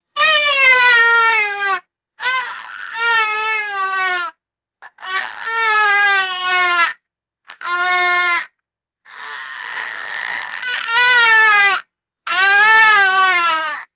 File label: raspy dog toy squeaker crying